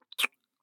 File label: Kiss mid; 搾精悪魔ちゃんの5日間寸止めゲーム～最後の日に失敗しちゃったら惨めな結末を迎えるよ～